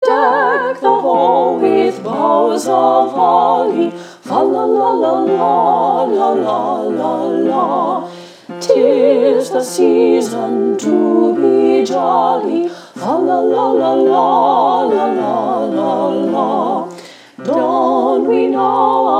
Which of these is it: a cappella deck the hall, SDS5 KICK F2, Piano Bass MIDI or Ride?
a cappella deck the hall